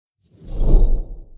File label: transition